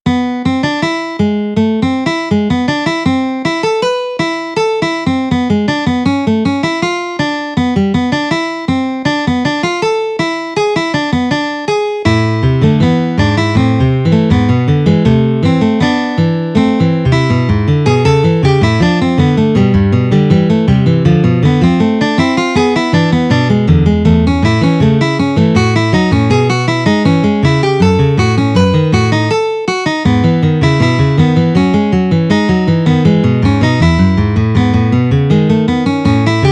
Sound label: counterpoint for classical guitar